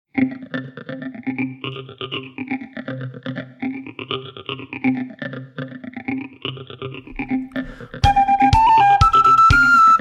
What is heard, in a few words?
Belfast Polka Pennsylvania Railroad